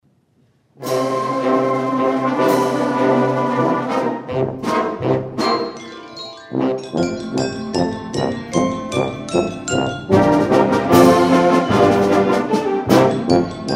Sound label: Mallet Maniacs